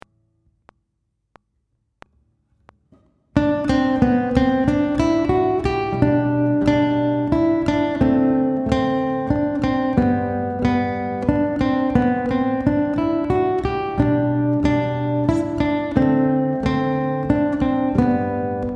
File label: Waltz in G, Guitar; Grade 1